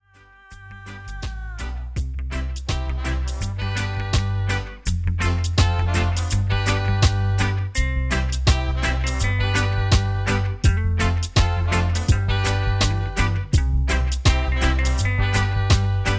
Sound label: REGGAE